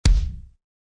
Kick Alt Rock; REAL LIVE KITS; Osama Qureshi DrumKit

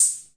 hh, Wersimat 24; DRUM MACHINES

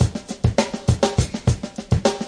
101, drum loops

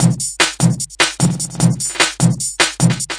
150 squished kick rolling, DRUM LOOPS and BREAKS

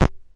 drop needle; Scratching and Turntablism; DRUM LOOPS and BREAKS

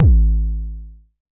Jomox MBase, drum machine; Jomox MBase 01

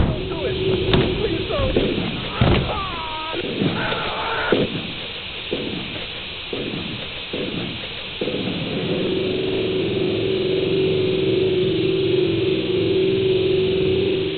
disturbing noise shots vox; Sound FX Real Life

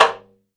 taiko, Japanese Drums; ETHNIC and WORLD PERCUSSION

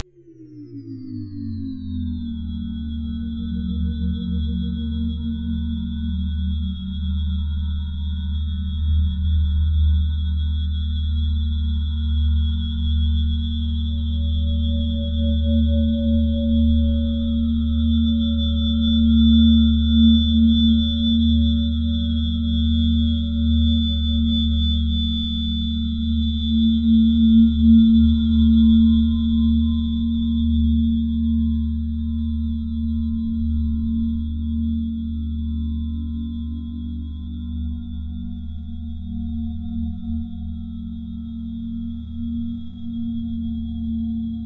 ambient space harmonics

